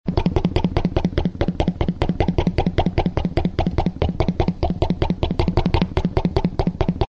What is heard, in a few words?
comedy fresh popped